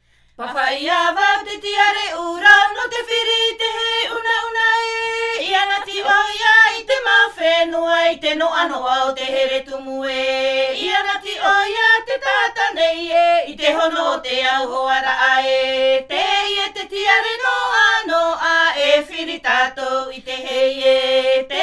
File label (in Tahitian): himene tarava Tahiti